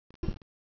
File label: wpn hud off